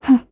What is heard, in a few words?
laugh; Eternal Return; Katja; KOR